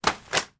foley letters fall on floor from letterbox internal